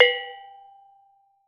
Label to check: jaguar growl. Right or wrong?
Wrong